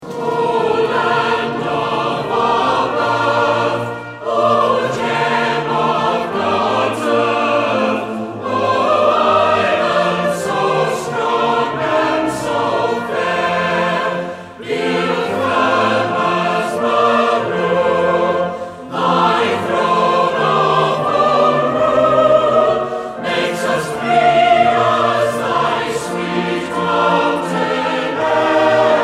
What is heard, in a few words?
Manx National Anthem; edited one vers